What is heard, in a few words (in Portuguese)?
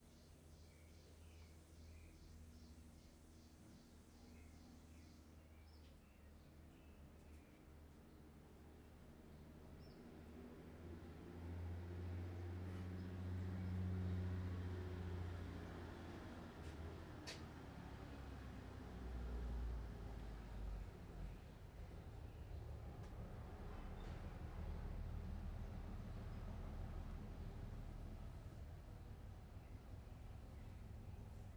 Ambiencia moto chegando do lado de fora do atelie ao meio dia com cigarras